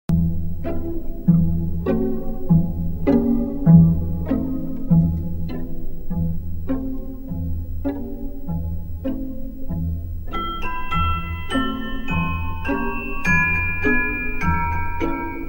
Τσελέστα Χορός της νεράιδας των ζαχαρωτών

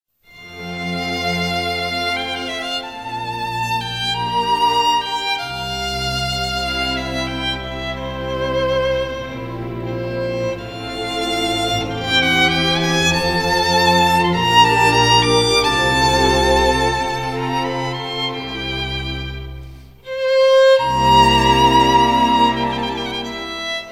βιολί Ρομάντσα για βιολί σε φα μείζονα

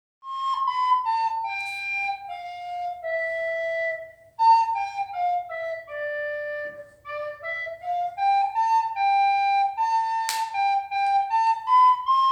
Φλογερα

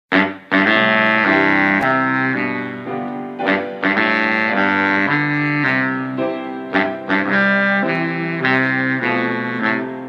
Happy Birthday Διασκευή από ρυθμικό συγκρότημα; S.Birchard

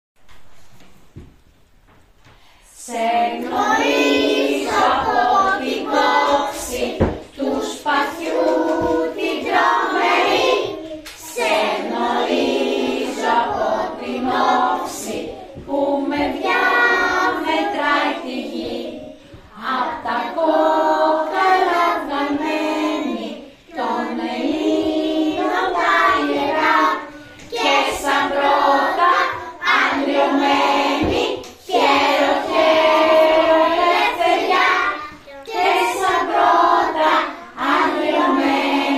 Εθνικός Ύμνος από τα παιδιά

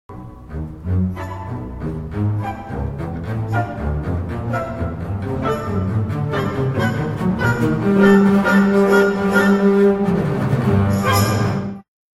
Κοντραμπάσο

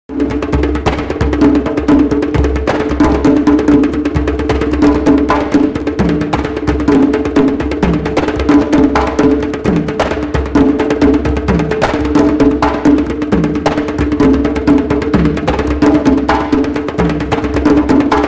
Ηχητικό δείγμα τρίο αφρικανικών κρουστών

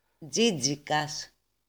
ΤΖΙΤΖΙΚΑΣ

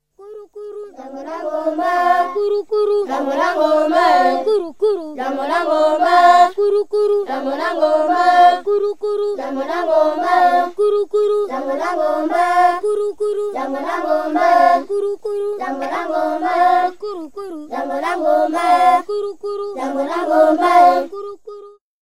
Αφρική

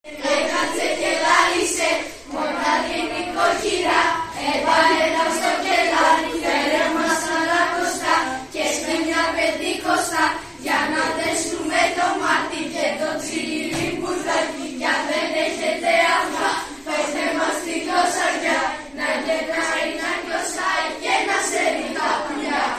Κάλαντα του Μάρτη

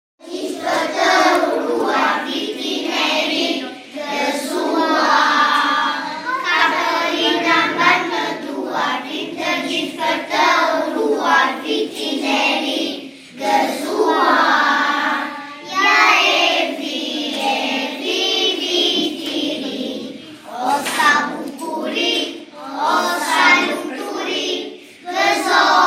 Αλβανικά κάλαντα

